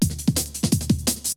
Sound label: BREAKBEATS, TECHNO